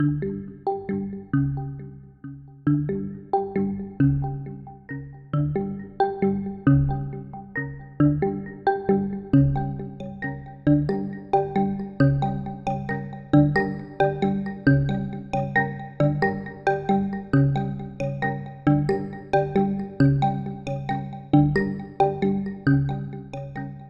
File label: Searching